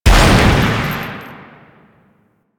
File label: Shotgun Shot; Warframe; Veilbreaker; Kahl